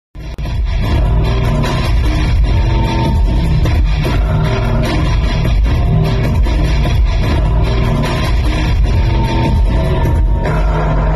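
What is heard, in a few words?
movie background music ringtone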